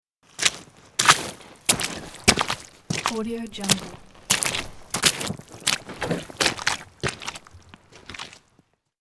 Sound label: walking in mud; electronplanet